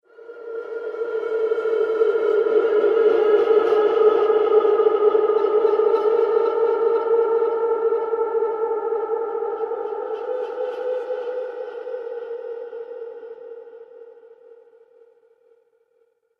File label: Screams Horror Atmosphere, scary soundscape